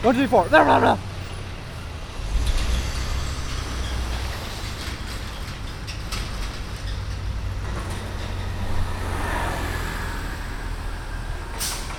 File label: True Field Recording Grindcore; In San Francisco